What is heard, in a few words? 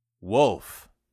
wolf; 5etools; bestiary